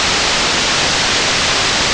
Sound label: Telly Static Loop; BedRoom